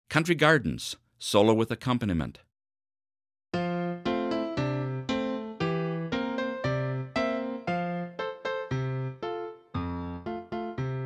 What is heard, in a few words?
French Horn Solo With Acc; Country Gardens